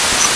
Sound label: pond